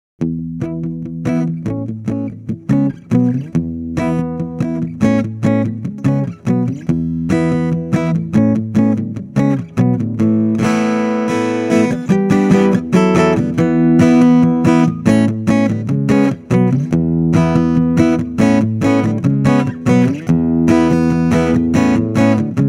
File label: rock aer